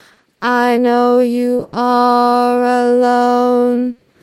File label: singing